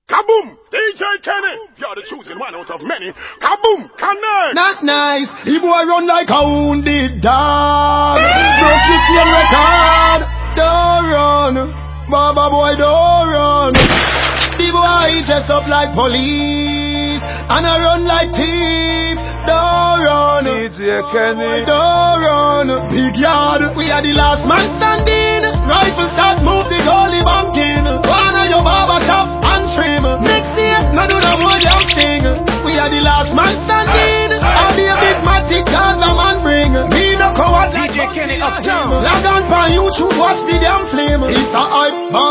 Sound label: boomtune